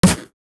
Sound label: Snare, Drums; Snares - Claps